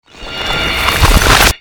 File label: magic morph